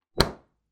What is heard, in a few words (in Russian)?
dverca holodilnika